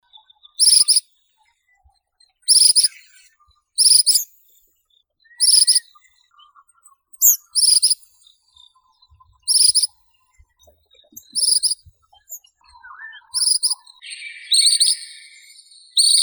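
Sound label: ashy minivet Pericrocotus divaricatus, nature sounds; lichinkoed serii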